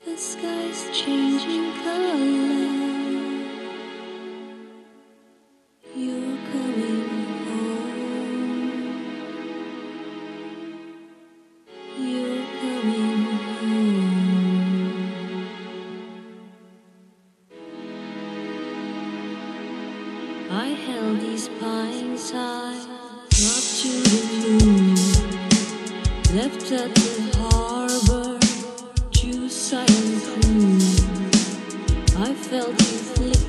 chillout singing dance 432 HZ; I MISS YOU

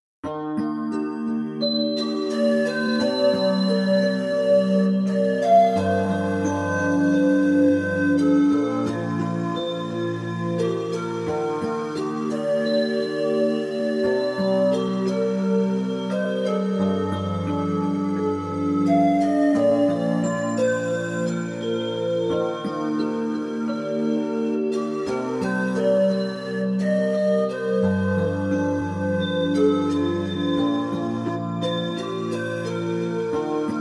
spa music 432 Hz; spelnione marzenie

